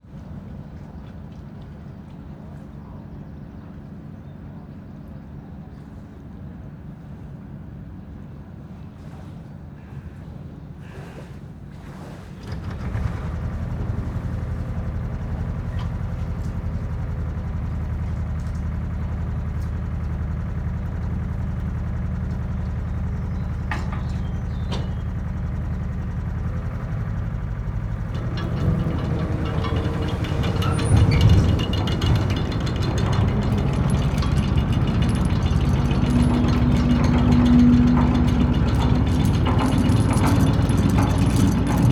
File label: ferry engine start and departure, chain rattling, Chain Ferry; MKH3040; Detunized